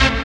dance chord

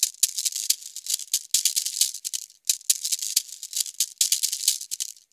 ETHNO PERCUSSION 90; ethno2

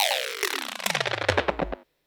Industrial 140

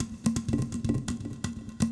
PERCLOOP ETHNO 125

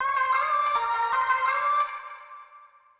ethno, 160, C

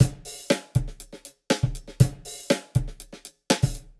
120 Now Ya Talkin, Warped Live Drums; Loops; Touch Loops; CosmicFunk

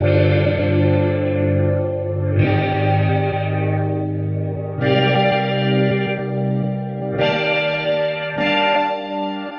100 Gm7 Warped Piano; Loops; Touch Loops; CosmicFunk

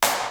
SD NOIZ, snare drum; SNAREDRUMS

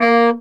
B SAX STC A#2, Baritone Sax Stacc; Orchestral; VarazdinOrchestral

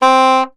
Soprano Sax Portato C3; Orchestral; DirectWave; Varazdin Orchestral

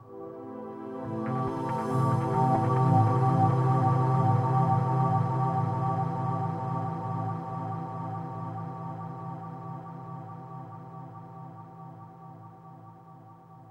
techno pad 140; Techno2; Keys

